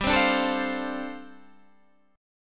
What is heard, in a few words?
G 9th Suspended 4th